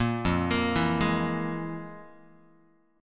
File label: F Minor Major 7th Double Flat 5th, Guitar; FmM7bb5 arpeggiated; JGuitar